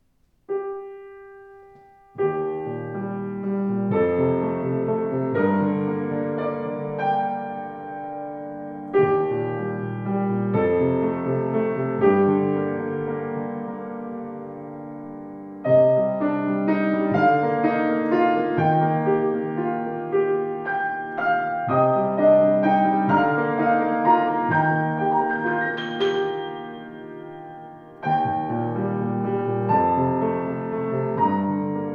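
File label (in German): Yamaha C3 Klang, Flügel; 186cm; 186cm-c3-fluegel-2003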